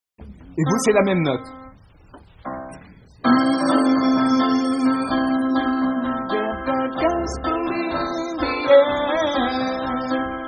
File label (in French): Hommes